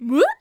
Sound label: Mouth Fx, Vocal